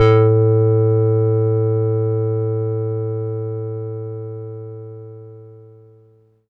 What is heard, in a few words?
Octaver a3, Korg Poly-800